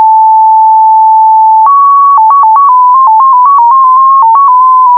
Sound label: MFSK8